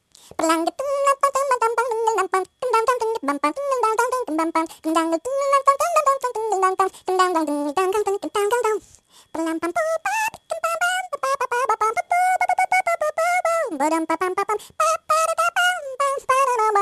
beatbox ringtone

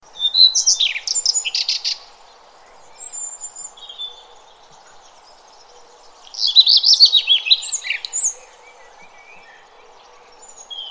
morning birds song ringtone

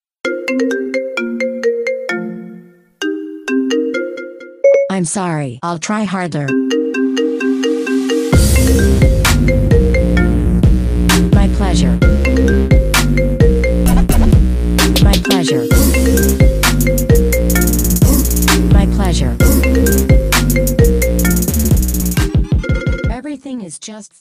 marimba remix ringtone; siri

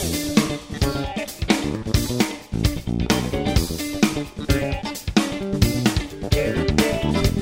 groove ringtone

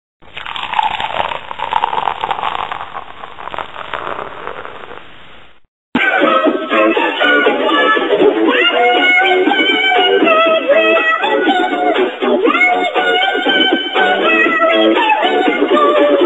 chipmunks ringtone; coca cola

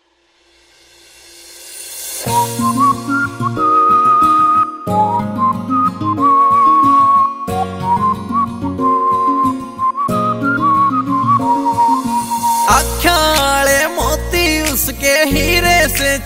haryanvi songs

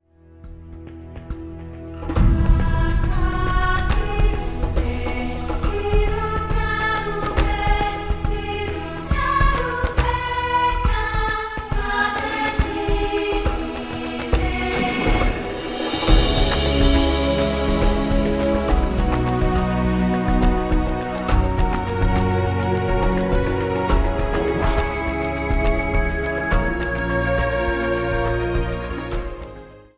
ringtone; avatar

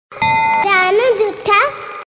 cute gujarati sms ringtone

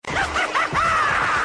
hollow laugh ringtone

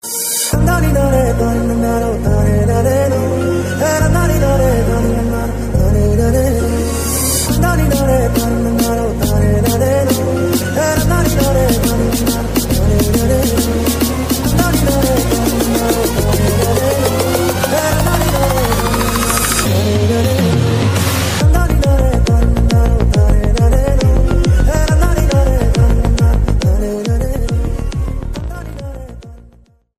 psy trance remix ringtone; kgf

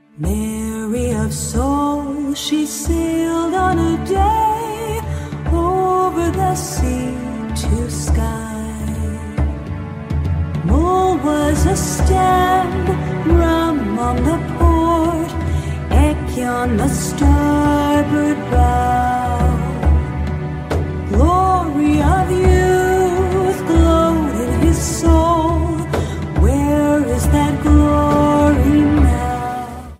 theme song ringtone

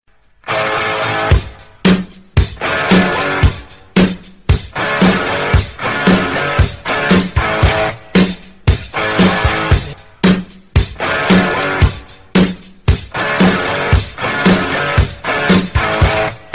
ringtone

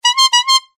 bike horn sound ringtone

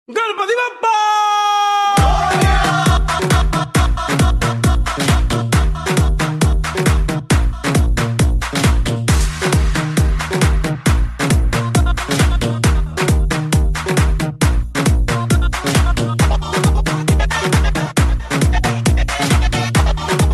ganesh dj mix aarti ringtone

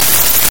ringtone

